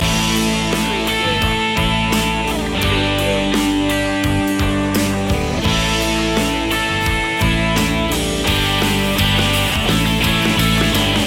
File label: great feeling loop